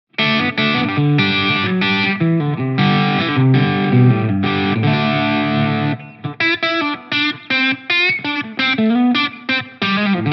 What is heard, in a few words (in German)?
Barncaster Steg full Coil crunch; Tarot